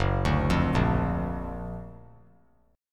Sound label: Gb Augmented Major 7th Gb down 3C,3F,3A,3D; Gb+M7 arpeggiated